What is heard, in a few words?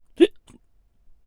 Hiccup